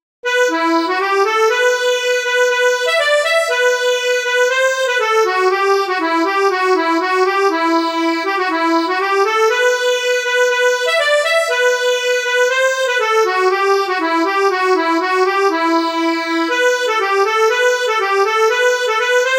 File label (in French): bourree, accordeon